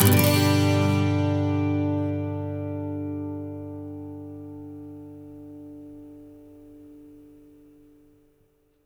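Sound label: 12 string major G, Electric & Acoustic Guitar Loops; USB Soundscan vol.04; AKAI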